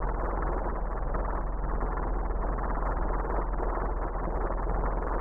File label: Turn; Beamos; Zelda; OOT